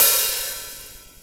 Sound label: drum hit whistle